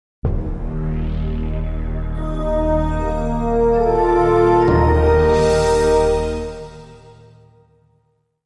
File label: Classic TV Logo